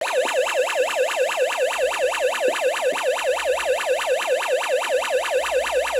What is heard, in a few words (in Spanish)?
tono